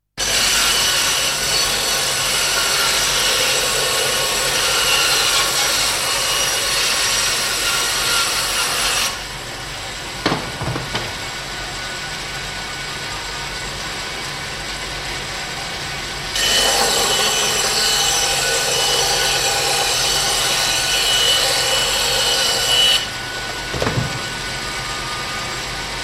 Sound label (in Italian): sega a nastro